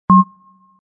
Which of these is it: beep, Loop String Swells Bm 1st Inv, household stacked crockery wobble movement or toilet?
beep